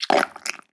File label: mud wet squirt splat; a dump in the dark